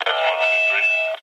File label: radio feedback